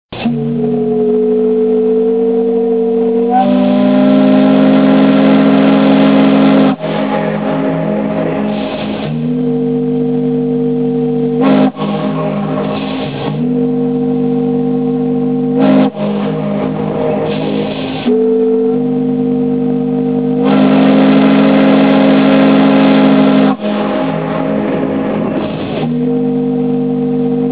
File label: horn